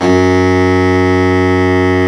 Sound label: BARITON SAX